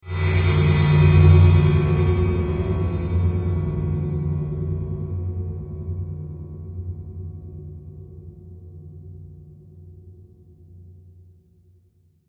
SWELL DARK ROB, Swell Dark Robotic Metallic Reverb; Blastwave; Swell Sound Effects